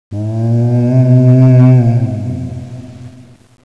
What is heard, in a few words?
birds